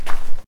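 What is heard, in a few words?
fence gate close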